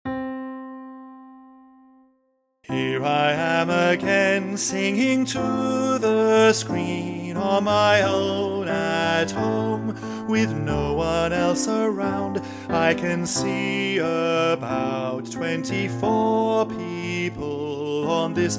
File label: CHOIR 1 VOICE; Gospel Choir